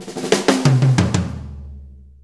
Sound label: 6-8 Fill, Drum Tools